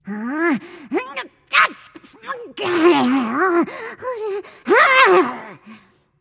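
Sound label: nonverbal grumble; smite; voicepack; izanami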